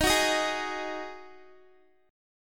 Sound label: D sharp Minor Double Flat 5th, Guitar; strummed